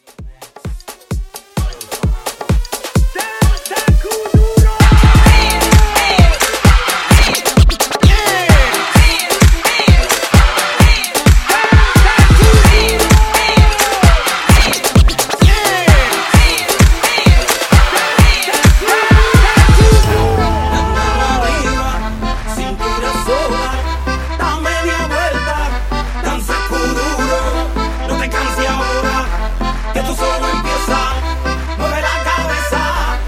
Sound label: Afro Scratch